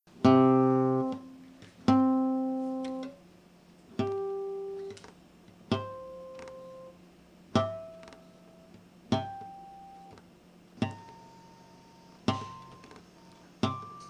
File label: guitar harmonics c